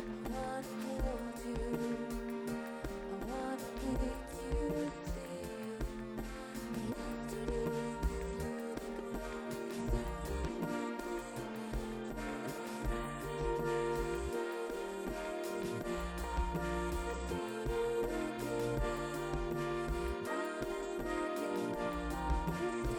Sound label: piano; SiSEC11